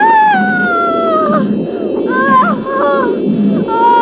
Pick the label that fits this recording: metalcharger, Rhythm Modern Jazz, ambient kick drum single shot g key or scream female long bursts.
scream female long bursts